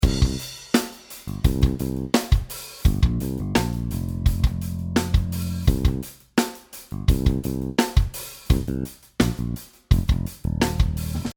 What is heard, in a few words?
Drums Bass Sidechain